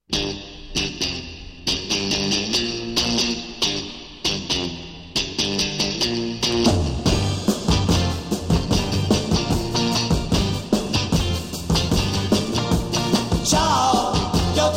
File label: Italian beat 65